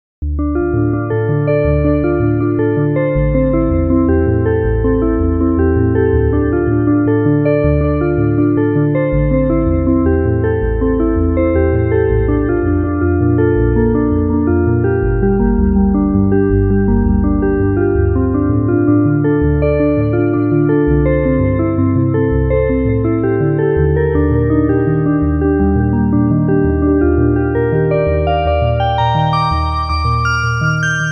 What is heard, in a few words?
Mellow Glass Delay